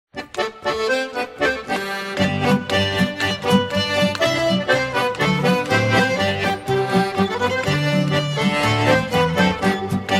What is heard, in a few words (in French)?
Polka, Etats-Unis